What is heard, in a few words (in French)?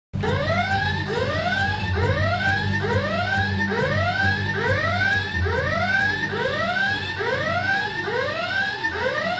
son du buzz; Secret Story